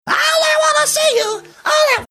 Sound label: singing